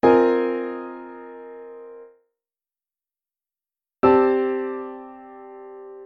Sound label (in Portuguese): quartas e quintas